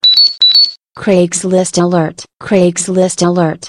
Alert; Craigslist

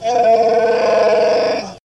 Raar

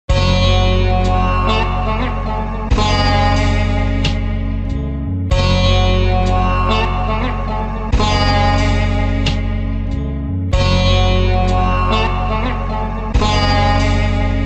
Wavy

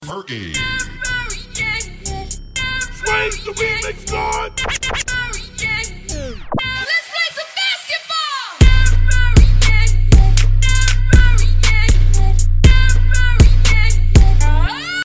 Anthem remix

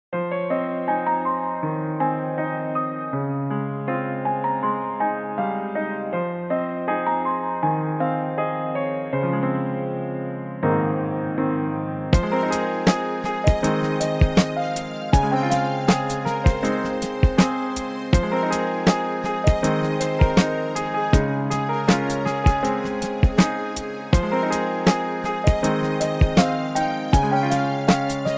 Lofi

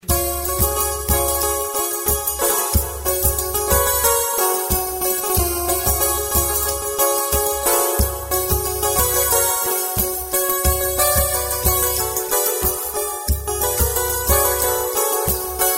Music

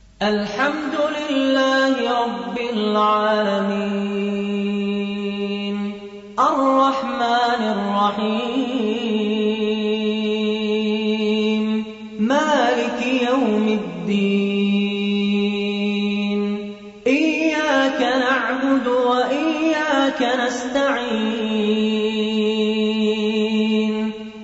Beautiful Fatiha

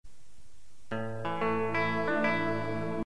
Cool Msg

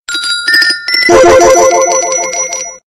Jetsons Phone

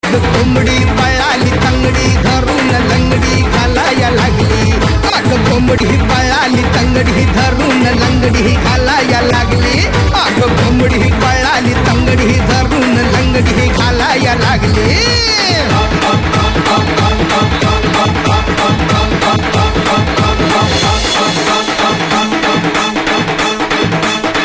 Kombadi Marathi

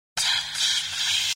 Sms Touch

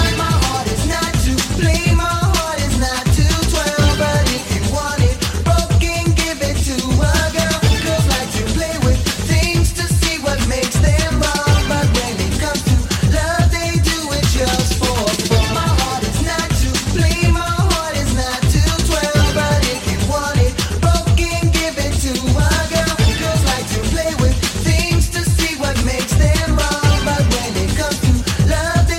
Latin Freestyle

